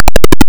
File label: shell whistle; River Raid